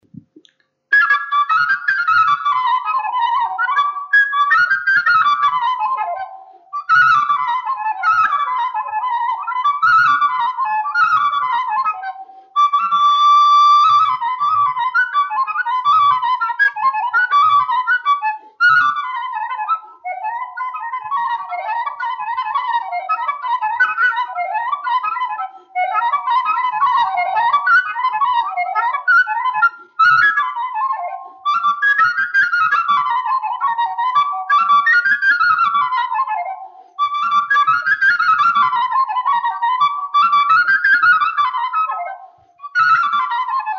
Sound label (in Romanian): Rusasca fluier; Vaslui